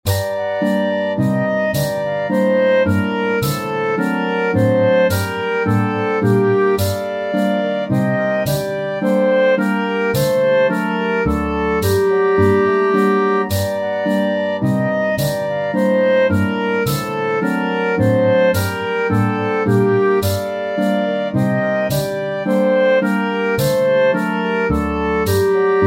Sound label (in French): Bourree; Bourree Du Plaix